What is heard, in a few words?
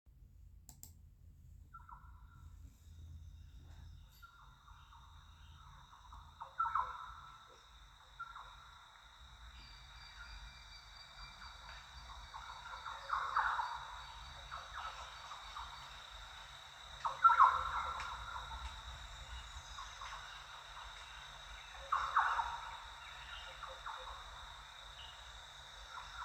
early morning rainforest